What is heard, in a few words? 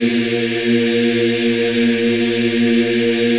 A#2, Choir; USB Soundscan vol.28; Acoustic & Synth; AKAI